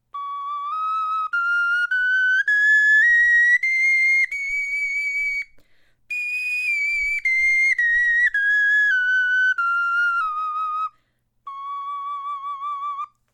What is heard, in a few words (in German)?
tonleiter, knochenfloeten